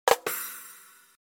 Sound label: punchline